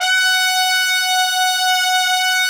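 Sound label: F#5 TRUMPET; Northstar - New Gold VOL-1; ST.TRMPTS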